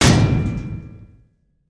Auto Cannon

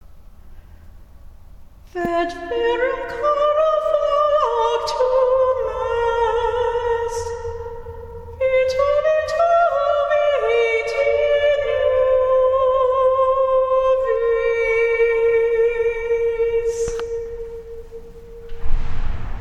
Singing Soprano In A Tunnel

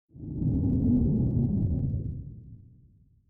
Power down

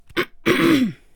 Clearing throat Female

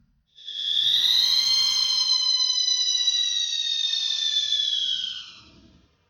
Scream

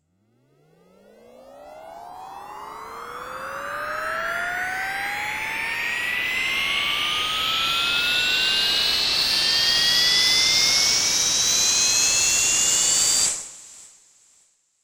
Riser And Noise

